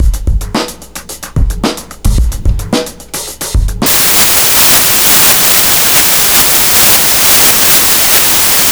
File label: bass beat